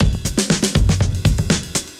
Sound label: breaks, 120bpm; free bespoke breaks; BeatStyleC